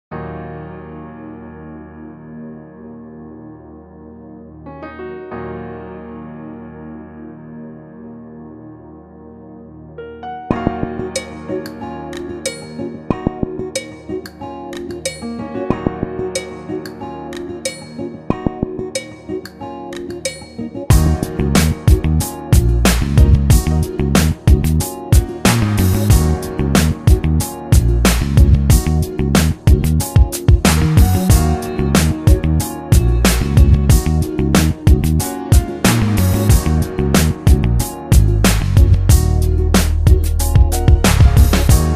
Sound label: instrumental remake